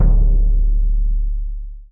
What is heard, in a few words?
Bd Deep